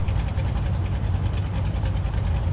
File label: idle, vehicles cars; lwcars